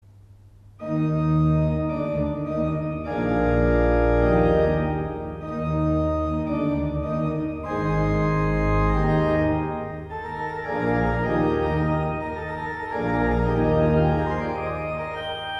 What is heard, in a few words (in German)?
Präludium in Es-Dur; Carl Gottlieb Umbreit